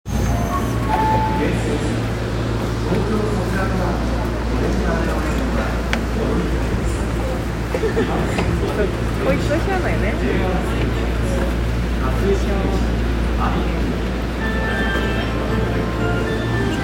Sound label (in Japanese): 千代田線町屋東